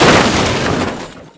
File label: crash, vehicles lambo2